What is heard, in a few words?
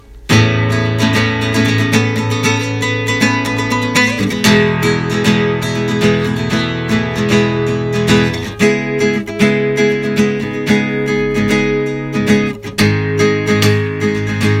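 Yamaha CG122, klassicheskie gitary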